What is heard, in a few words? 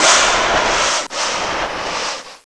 leak